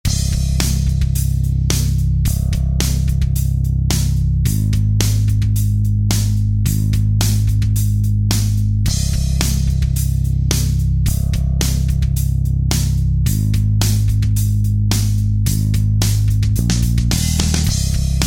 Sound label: Heavy Drums Bass